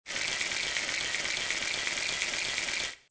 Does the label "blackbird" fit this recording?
No